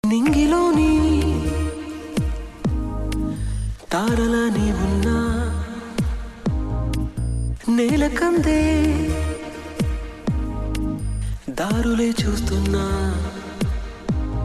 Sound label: telugu; ringtones; happy days